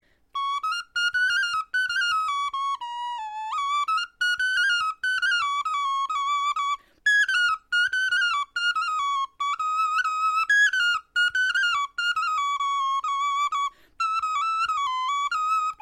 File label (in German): melodie